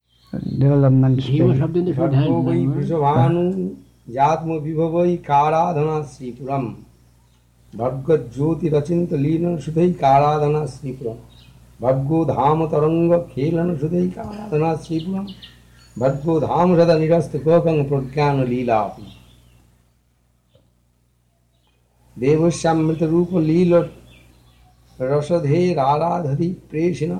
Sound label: Gayatri